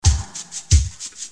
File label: RHYTH, LOOPS